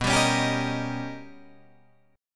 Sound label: B Augmented Major 9th B Guitar Open D